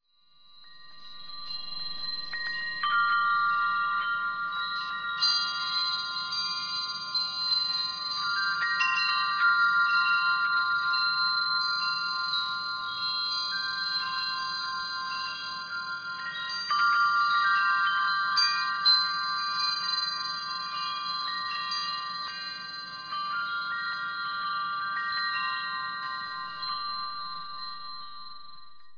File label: windchime